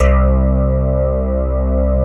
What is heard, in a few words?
basses; Wizoo - Powered Wave